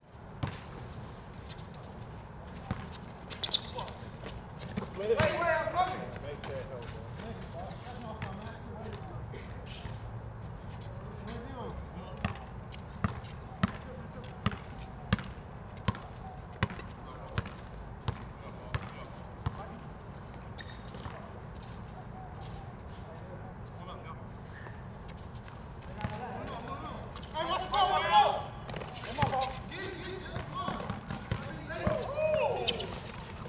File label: Downtown Houston Root Memorial Square Basketball Game